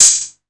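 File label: 808 open hi hat; Wizoo - Platinum 24 Electronic; 808 DRIVE P1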